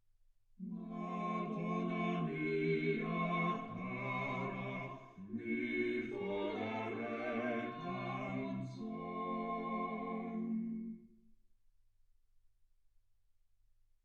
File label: quartet